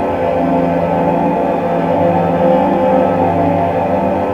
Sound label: F3 air vox; Northstar - The Wizard VOL-1